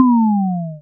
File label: lose; Kenney's Sound Pack; Retro Sounds 2